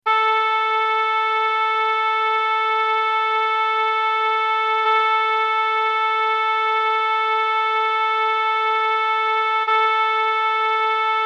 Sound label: Tuning A Oboe Sound